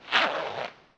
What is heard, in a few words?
zipper